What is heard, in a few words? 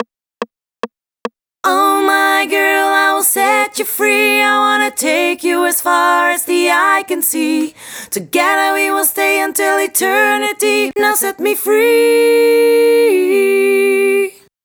Chorus Full, 144 BPM; Vengeance Samples; Set Free